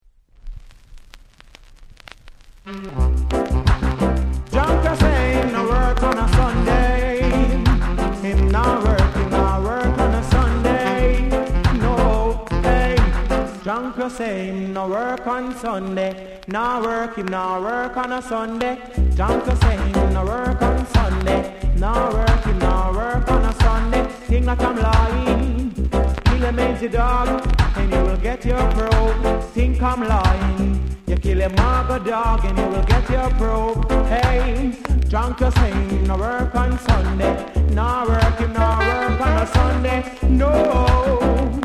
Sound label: TENOR SAW